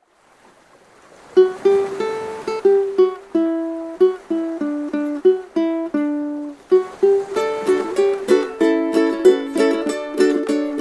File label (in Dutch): emmer met zee zonder zang